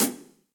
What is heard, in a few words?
Hihat Closed Low Loud Squashed; Drum Hits; Standard Hits; Ableton; Factory Packs; Session Drums Club